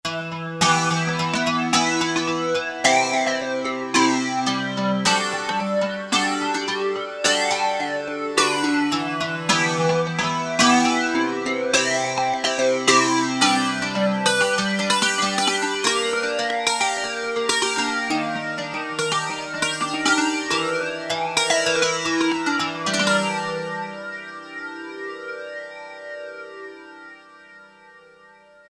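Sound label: Electric, Guitars; Korg DSS-1; ElectrkD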